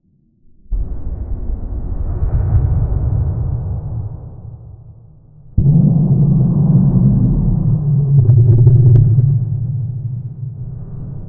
volcanic eruptions with o

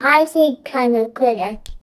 start a quest for, adventure

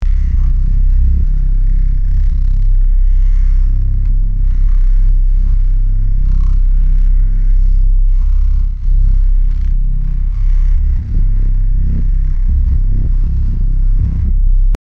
a deep bass ribbit as

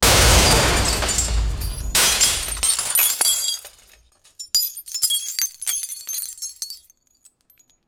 a glass apple slipping; break

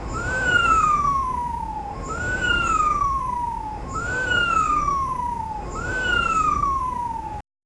camera shutter click low

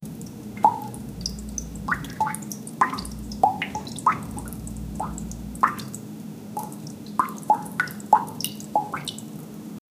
slow water drips, bathroom

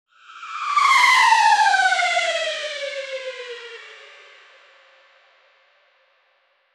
flying woosh shhhwwwwppp; air

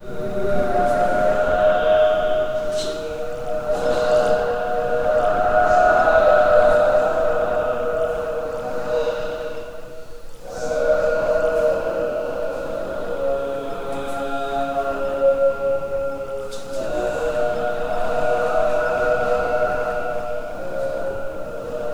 tibet monks inside large, cave

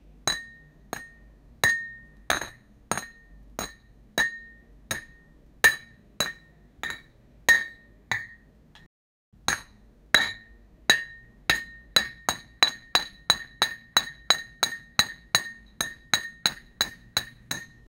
cups making ceramic clink; glassware

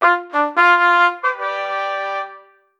a short cheerful trumpet

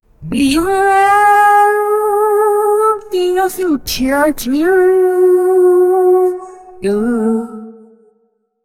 singing you wild so echo

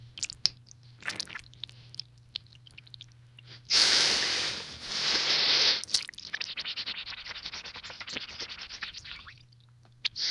wet sticky bubbly farts

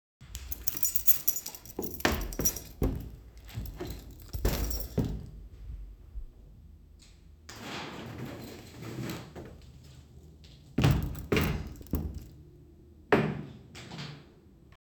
the sound of the front, domestic

